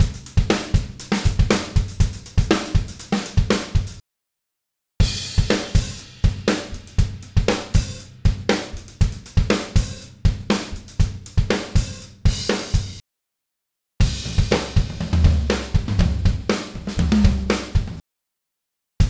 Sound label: Modern Rock Drumbeat 120 BPM